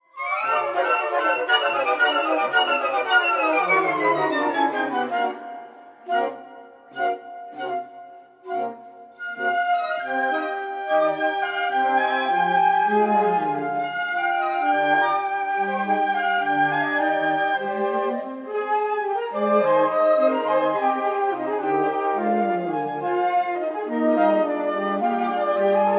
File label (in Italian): Flautofonia